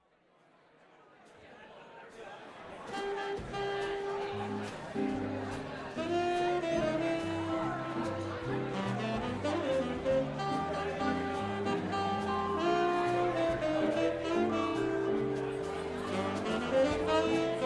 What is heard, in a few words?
quintet